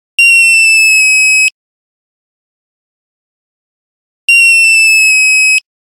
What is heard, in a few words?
Nokia Buzzer Rocket; Windows Phone apps